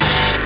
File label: techno